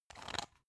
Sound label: pepper mill slow soft; berufe; gastronomie; soundpool